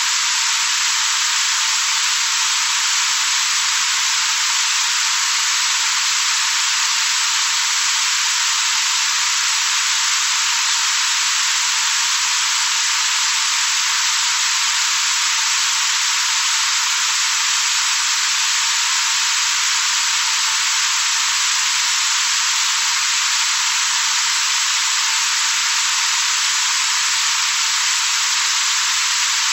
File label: soft rain